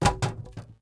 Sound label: club hit metal; objects; Star Wars Obi-Wan; XBOX